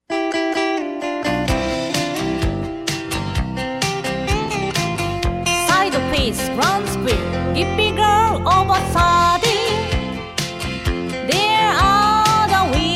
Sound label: vocal, square dance